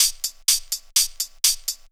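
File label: hihat loop 125 bpm, Percussion Loops, Hihat & Filter; Houseworx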